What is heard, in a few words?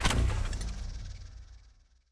spin button start; conan